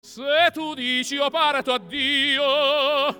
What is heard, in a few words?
Vocal; spektr analys